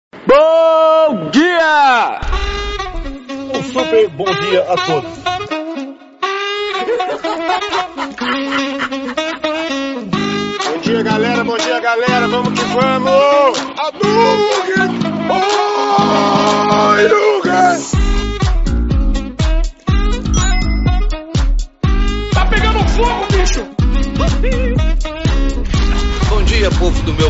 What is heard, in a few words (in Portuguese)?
bom dia remix